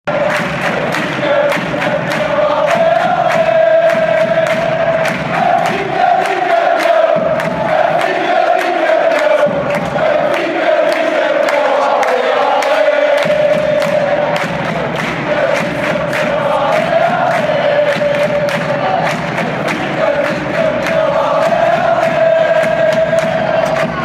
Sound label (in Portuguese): benfica bicampeao, fan chants